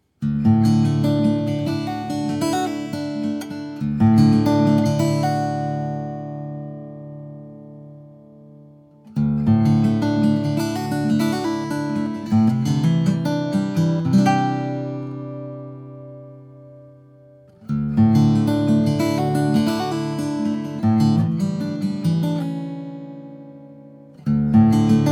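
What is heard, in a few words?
Guitar Sample 26a, Vintage Prewar Guitars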